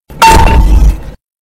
green light sound effect; crab game

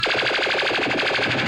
guitar track

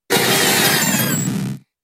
growl

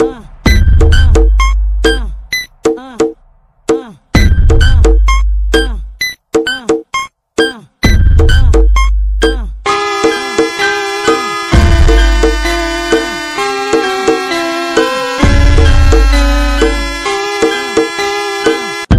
funk

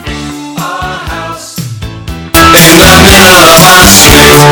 bass boosted

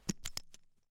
grenade

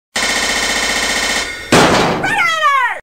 logo; frederator incorporated

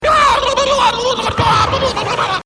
turkey gobble; star wars battlefront

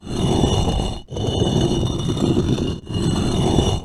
dragging stone

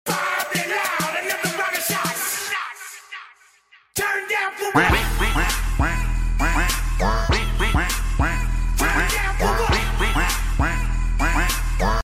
quack

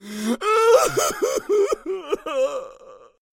cry male

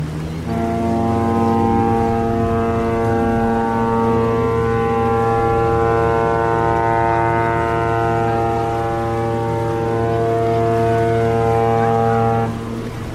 ship horn

